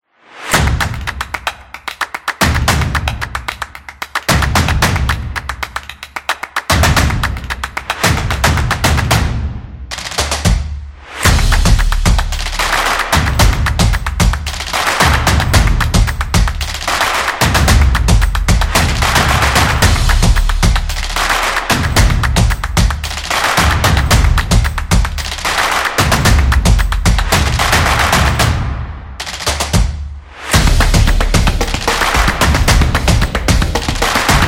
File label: percussion drum beat; sports beat